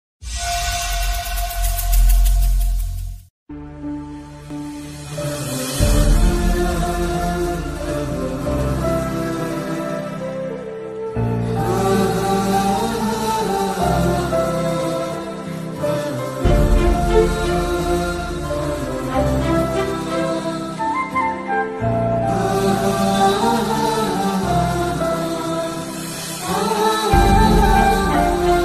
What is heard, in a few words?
sad bgm; oh my kadavule